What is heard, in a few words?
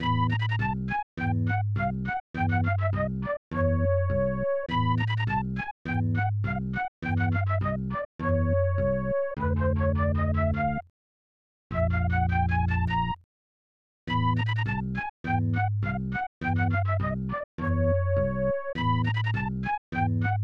Spooky Haunted House; shonen ashibe; snes